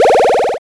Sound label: Level Clear; gamerip; Bullet Party